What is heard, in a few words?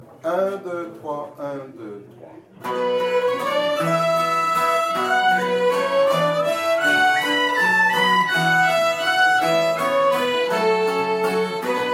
instrumental